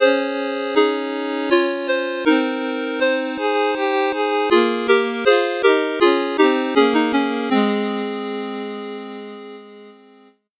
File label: 8bit jingle slow